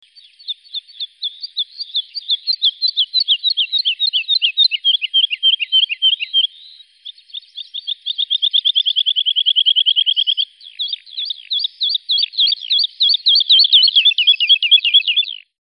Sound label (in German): Singvoegel Heidelerche